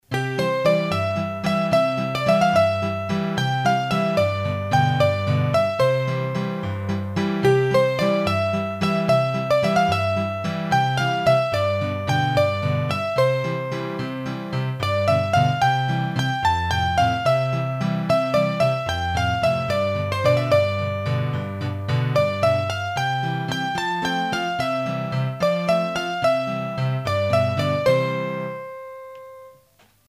Valses, hurdy-gurdy; Lo Rossinhol; Massif Central

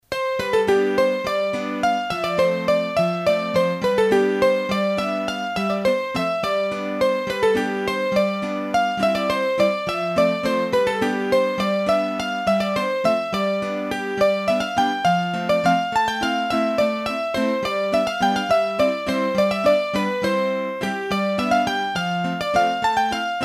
Bourree, hurdy-gurdy, Auvergne

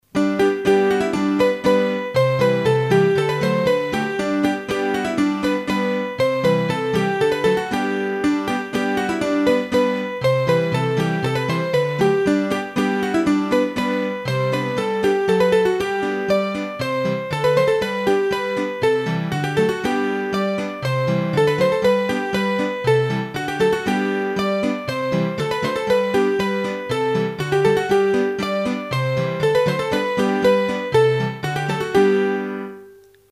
Polka Piquee, hurdy-gurdy

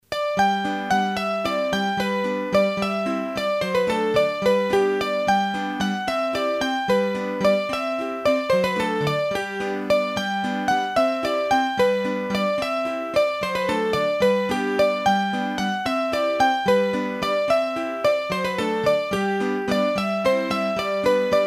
Valses, hurdy-gurdy; Aure Francoise; Massif Central

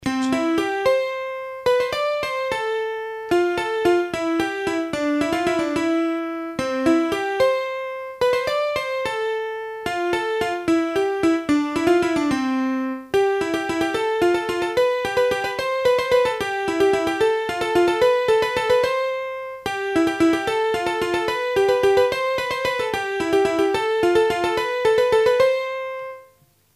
Parsou, hurdy-gurdy; trad; Massif Central

